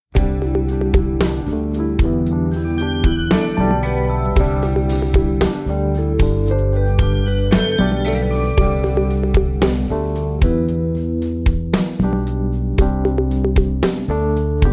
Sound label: Serious Part 1 INSTRUMENTAL; LEGALLY BLONDE JR AUDITION